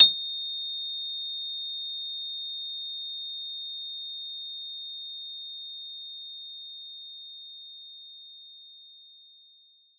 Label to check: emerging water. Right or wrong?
Wrong